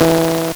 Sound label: LSDj, Nintendo Gameboy; 300 Drum Machines